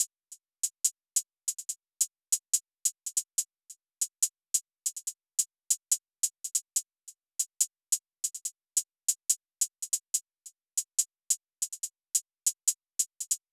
Hihat Loop 142 BPM, Drill; Auto

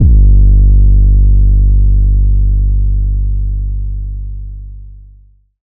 808 G1; Trap and Dubstep

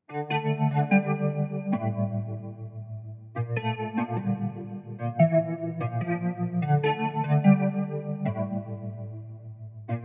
147 BPM D Min Guitar; Melody; Loop Stems; Cymatics; 2022 Melody USB Expansion; Visions Of U

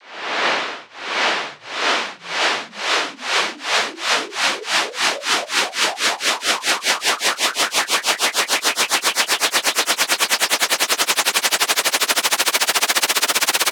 Riser Waves; One-Shots; SFX; Ghosthack; Color Bass Essentials

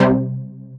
Techno Synth Shot C; 45 Techno Synth Shots 2025; Synth One-Shots

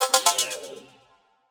Collectibles Sci Fi FX; Cymatics; Video Game Sample Pack Vol 1

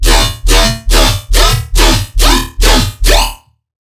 Riddim Bass Hit D#; Cobra

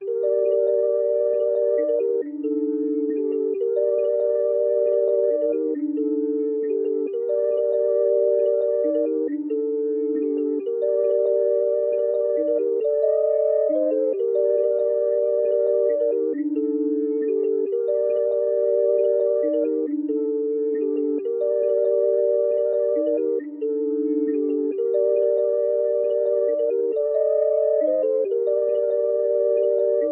136 BPM G Min E Piano; Melody; Trap; Loop Stems; Cymatics; 2022 Melody Collection - Trap Pt 1; Buss Down

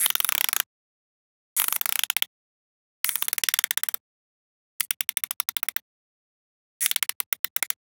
Wind Up Toy; Riddim; One-Shots; SFX; Ghosthack; Riddim Essentials Volume 2

